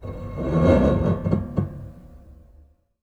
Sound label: String Rub Erratic, Piano; Piano Miscellaneous